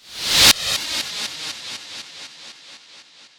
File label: Delayed White Whoosh